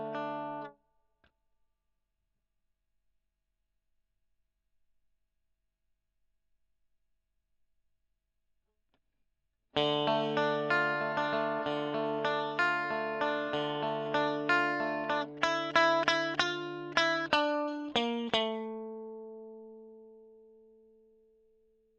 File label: E.GTR Gefell